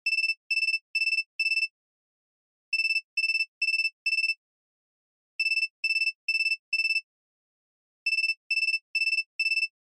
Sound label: Feature Phone Ringtone Low